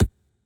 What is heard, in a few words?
reels stop; In The Forest; Wazdan